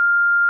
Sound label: record; AOSP; Telecomm